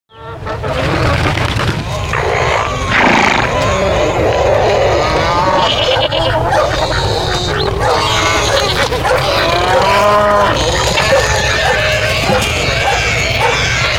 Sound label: farm yard